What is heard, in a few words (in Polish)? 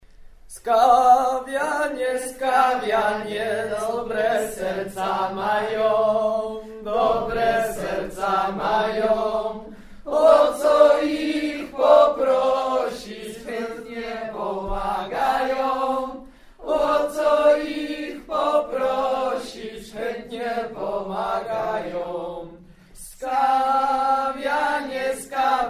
Skawianie piosenka